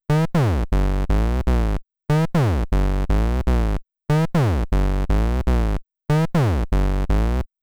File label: Bass Synth No Dist